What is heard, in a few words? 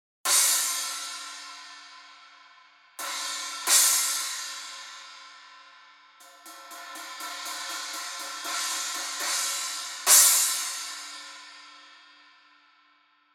Resonant Crash 14 Savage; Masterwork; Masterwork 14 Resonant Savage Crash sample